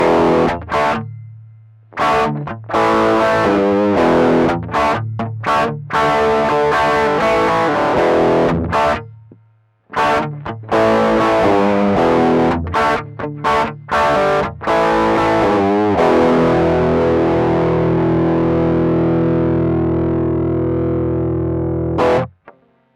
bb preamp gain; xotic

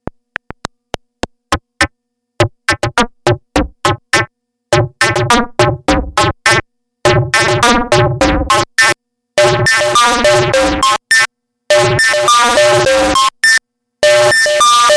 vermona perfourmer fm

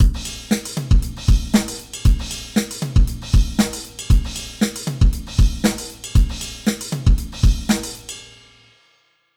Alesis SamplePad Pro

